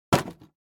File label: Wood Hit; Удар палкой; Звуки удара; Звуки удара для Форсакен